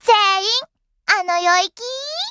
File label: applause; osu